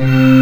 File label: SFX, FILM SCORE; AKAI S6000 CD-ROM - Volume 1